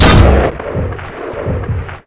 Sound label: grenade fire